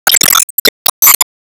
Spin Blade Loop

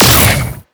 Shoot; TreeRex

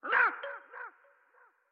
Mech Aggro; StyxMech

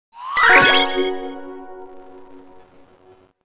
GOAL FANFARE; gamerip; PS3

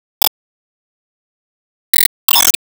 Enemy Gear Ring Bell; gamerip

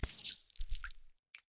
Grab Tongue; Lethal Company

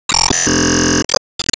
Enemy Water plant Active Charge

